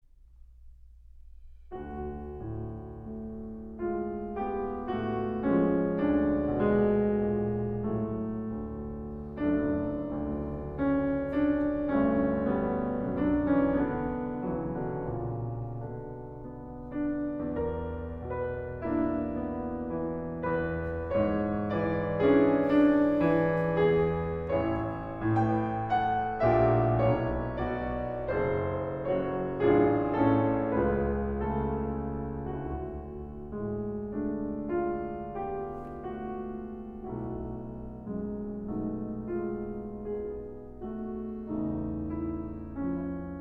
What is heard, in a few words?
Clarinet Trio, Andante